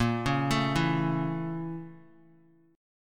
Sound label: A sharp Minor Major 7th Flat 5th, down, Guitar; arpeggiated; 4F,4A,5C,5G,5C,6E; JGuitar